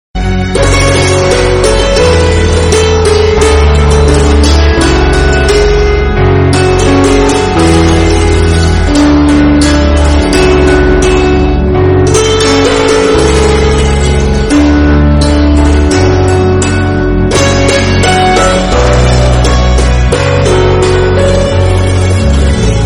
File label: instrumental ringtone; the xpose